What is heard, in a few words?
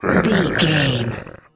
zombie voice general emote